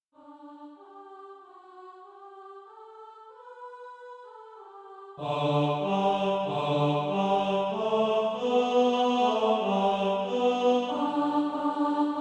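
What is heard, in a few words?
tenor, Practise Music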